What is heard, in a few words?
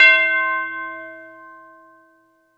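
E-Mu Proteus FX Tubular Bells C6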